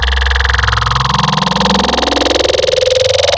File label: Growl Table, Serum; Cymatics